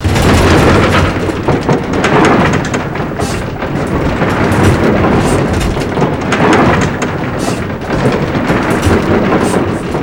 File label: seaknight rattles; airlift; Call of Duty Modern Warfare